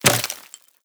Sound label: debris wood, bullet impact debris